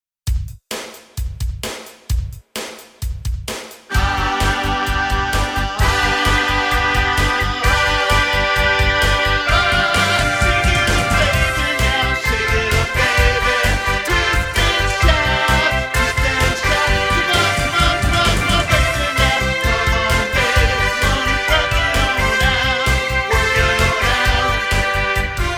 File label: One Man Band